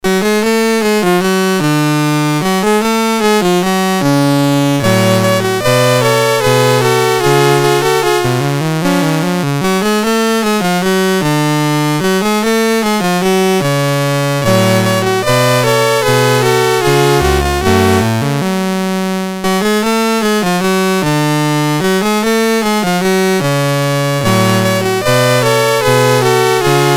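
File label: Polonaise in G Minor, BASIC, MOS6581R3; Commodore; MOS6581R4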